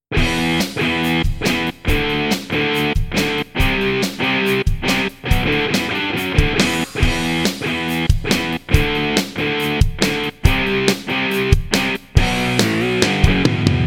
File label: attack